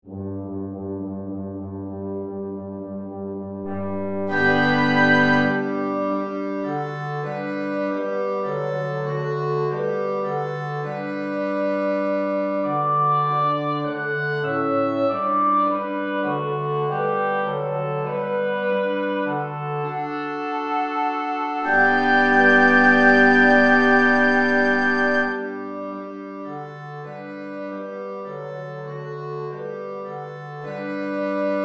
Polka

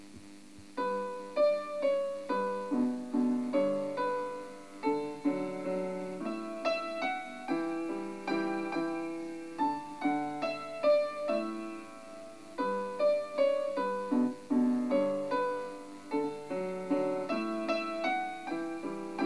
Valse arabe

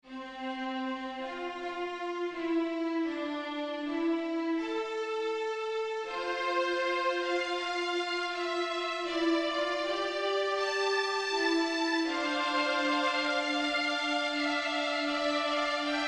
Fugue

